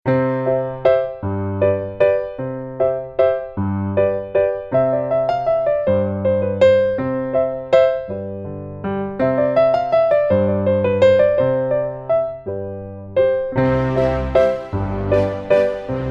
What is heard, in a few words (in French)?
Classique